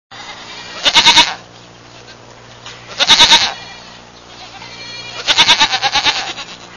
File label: Goat Bleeting